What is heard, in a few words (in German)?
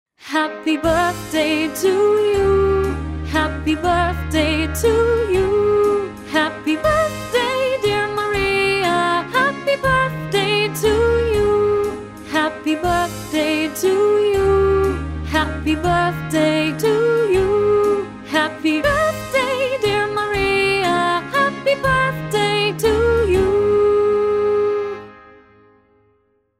happy birthday maria